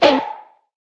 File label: bounce